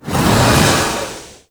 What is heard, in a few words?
Swarm Tear Spawn; Entrati